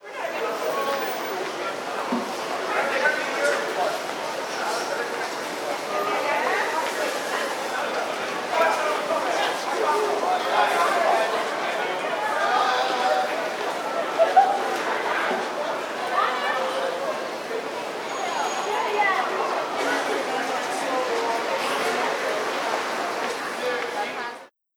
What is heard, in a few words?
Vatican city Walla